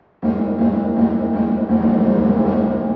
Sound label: Plate Reverb HIGH CUT